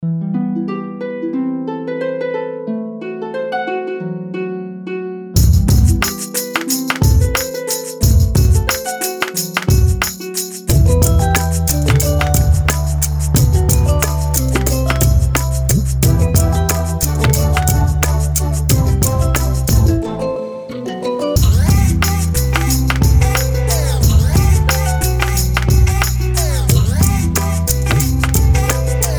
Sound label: Hip Hop Harp